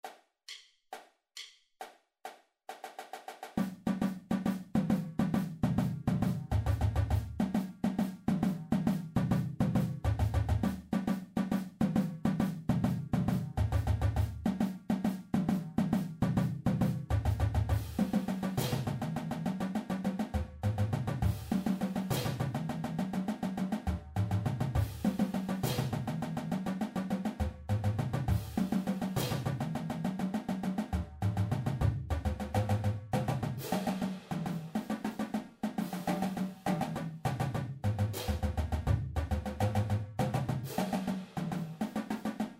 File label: shuffle beat